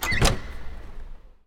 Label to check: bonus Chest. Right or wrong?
Right